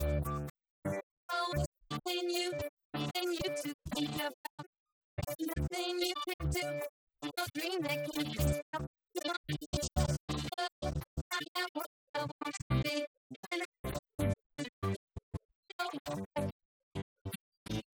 vocals; SiSEC11

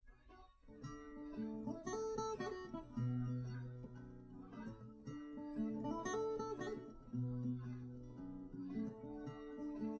test no drums live rec; SiSEC11; underdetermined

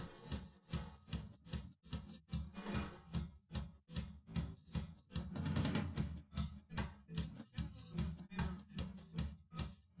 drums, live rec

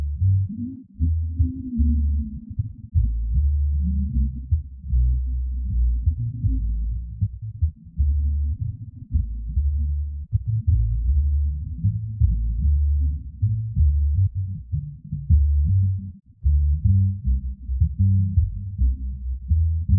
Bass; ICASSP11; DUET

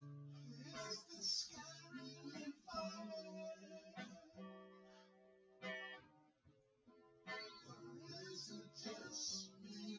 no drums, synth conv, 250ms, 5cm; SiSEC08; SiSEC underdetermined

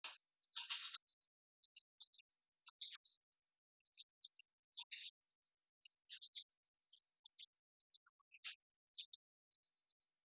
wdrums; SiSEC10; underdetermined

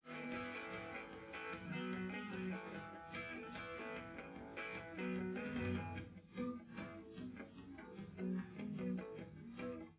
wdrums liverec 250ms 5cm sim

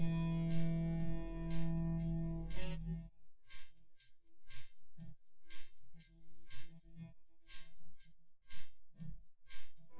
wdrums synthconv

